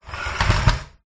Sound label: household bathroom scales slide on hard tiled floor